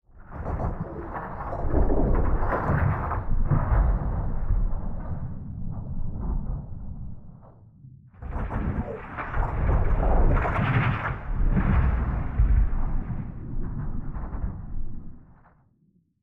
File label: Rocks Floating Moving Designed Rocks